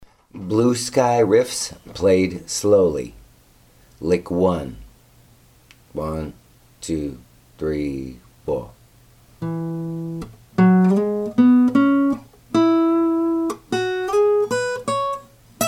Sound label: lick, guitar